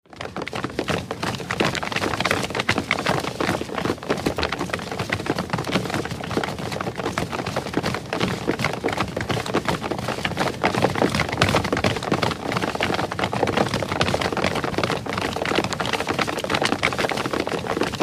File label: Grp Steps Marathon